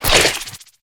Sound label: Web Shot; Lurker; Evolve; Gorgon